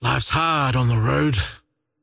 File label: teleport pain; dota; largo